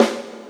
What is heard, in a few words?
Snares, Real